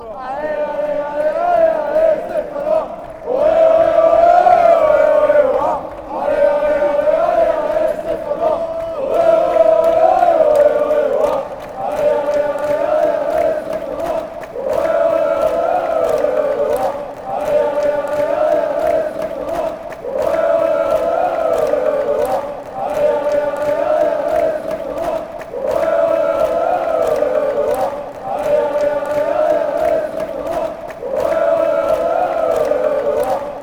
come come come, chants; stephanois; Association Sportive de Saint-Étienne Loire

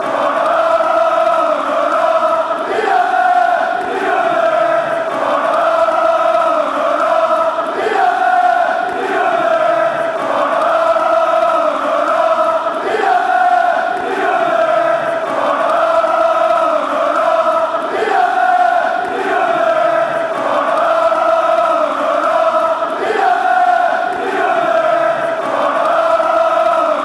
fan chants; lyon ol

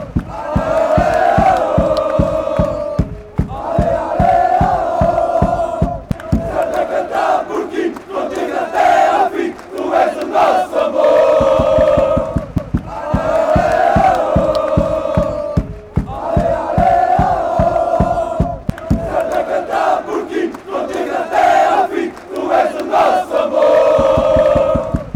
allez eh woah, chants

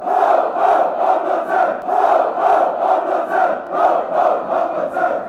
oh oh, fanchants; fc thun

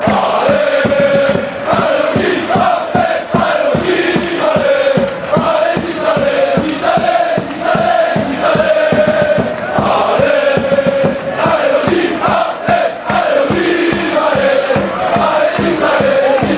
allez, fan chants

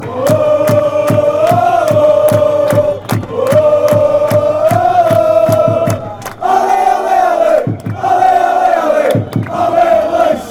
allez leixoes allez, chants

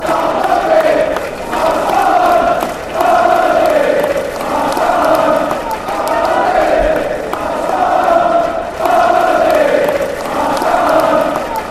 fan chants

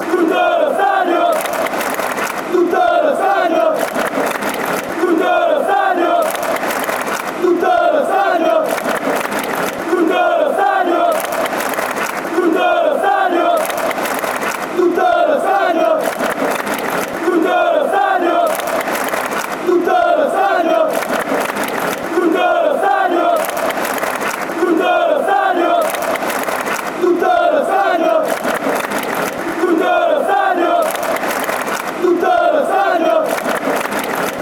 stadium, chants

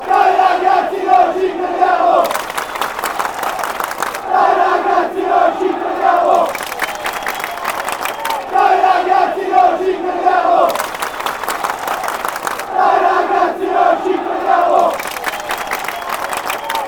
come boys, chants

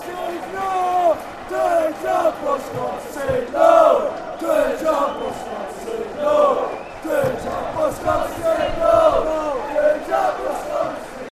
scum fan chants; jambo

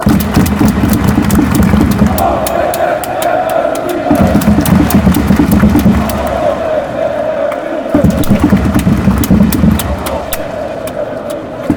fight and win, fan chants

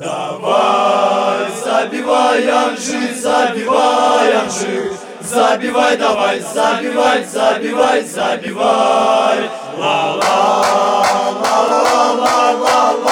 chants; slaughter anzhi

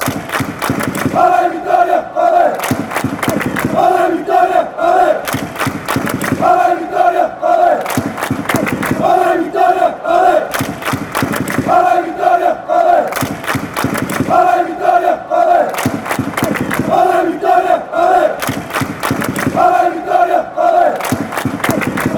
claps allez vitoria

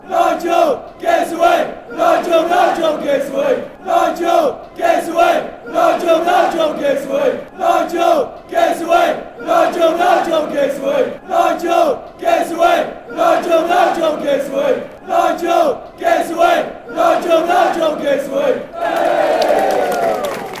give us a wave, chants

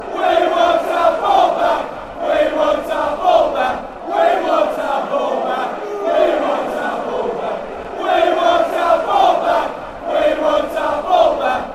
we want our ball back, fan chants

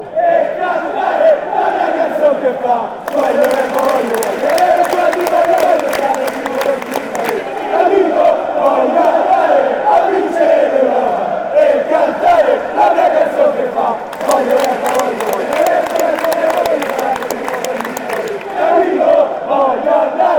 lazio chant

